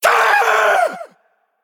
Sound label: Male Naga Death